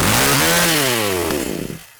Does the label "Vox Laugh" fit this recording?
No